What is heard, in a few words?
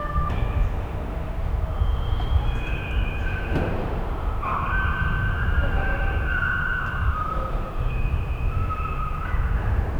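distant prison yard ambiance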